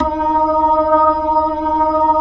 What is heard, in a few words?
B-3, Jazz